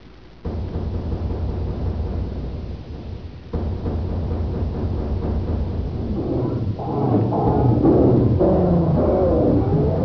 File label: horror